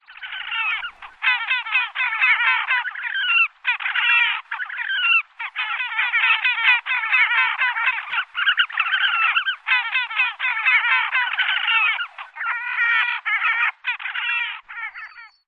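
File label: Horned Grebe, courting group; AK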